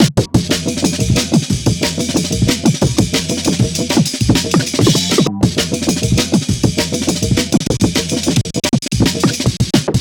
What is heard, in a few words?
Dr Loop